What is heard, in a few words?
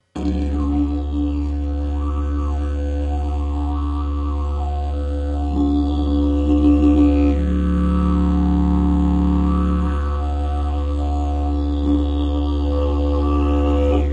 didgeridoo